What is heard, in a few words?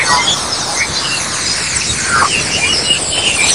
earth scream